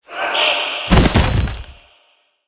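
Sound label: electric die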